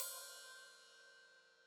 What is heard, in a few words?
Hat Open, Hi-Hat; Deeply Sampled Drums